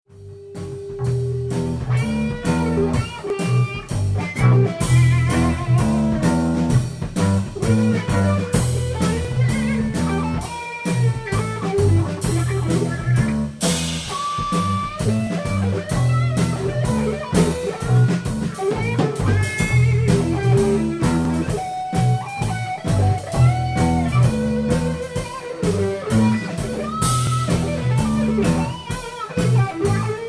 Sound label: Wailing Wah; ax84